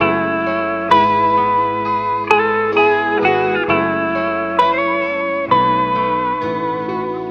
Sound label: guitar, Phonky Loops